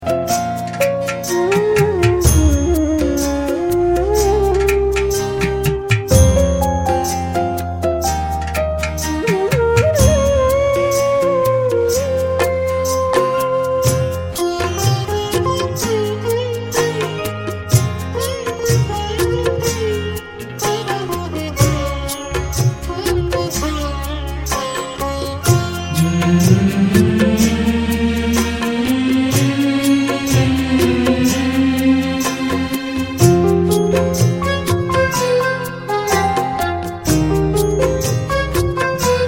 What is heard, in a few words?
Indian Instrumental